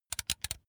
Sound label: razor extend comb long; maschinen; rasierer